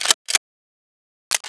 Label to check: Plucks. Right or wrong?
Wrong